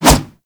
Melee Kick Whoosh; Warframe; weapons

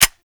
Quad Sniper Low Clip; Warframe; Weapons; Tenno

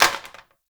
Plastic Drop

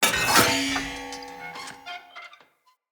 Light Mirror Hit Move E; Puzzles

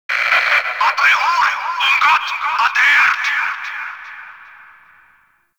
Interior Notification, Fortress Ambience; Warframe; Grineer

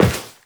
Step Metal, Footsteps, Walk; Warframe; Tenno

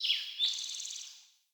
Bird Song; Pothia Bird

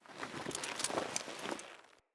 Foley Move Short; Friendly; Deora

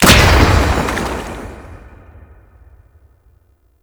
Mine Drone Underwater Explosion; Warframe; Grineer

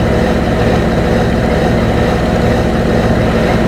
Generator Fan Loop; Warframe; Corpus Gas City; Ambience

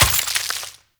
Vial Ability Ice Shatter; Warframe; Warframes; Alchemist; Elements

